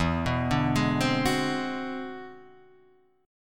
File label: E 7th Flat 13th; E7b13 arpeggiated; JGuitar